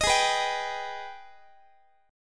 G sharp Minor Major 7th G sharp down Guitar; strummed; JGuitar

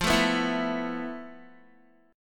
F Major 7th Flat 5th Guitar; strummed; JGuitar

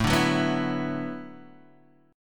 D 6th Add 9th A

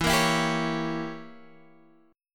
E Diminished 7th; Edim7 strummed; x,7,8,9,8,9; JGuitar

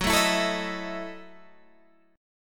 F sharp Minor 13th, Guitar, Open G

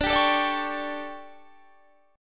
D Major 7th Suspended 4th, Guitar, Standard; DM7sus4; strummed; JGuitar

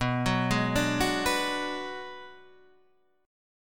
B Minor 6th B Guitar Drop D, chord

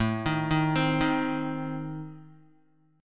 A Diminished A down Guitar; Adim arpeggiated; JGuitar

